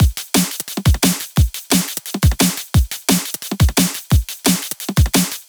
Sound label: drums 175 bpm